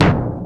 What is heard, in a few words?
WIND TOM; E-MU Formula 4000 Series Vol. 2; Orbit Presets